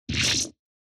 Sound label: Cut